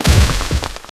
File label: slow motion footsteps; debris